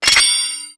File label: Item Menu Assign; Assign an item to B